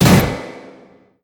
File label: plat, doors